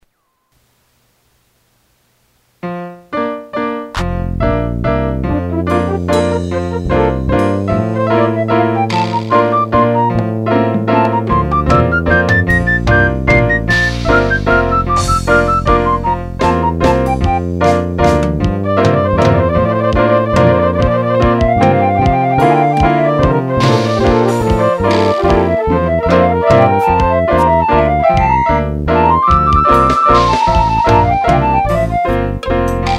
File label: Waltz Flute